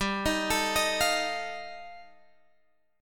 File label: G 7th Suspended 2nd Sharp 5th G chord, Guitar DADGAD